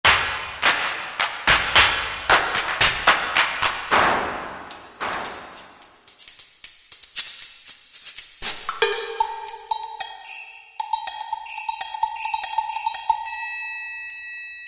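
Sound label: Bonus Percussion; Northstar Productions Drumscapes Vol.1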